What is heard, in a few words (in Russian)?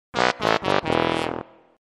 melodiya provala; Высмеивание и издёвка над людьми